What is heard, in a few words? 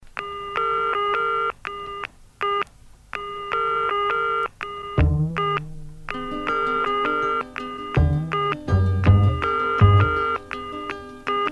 Telephone Rubber